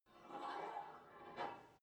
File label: take off weight barbell; sport freizeit